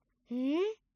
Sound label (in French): Chauve souris hmm; mirumiru